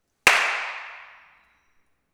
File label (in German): Kirche